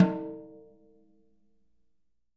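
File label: jazz snares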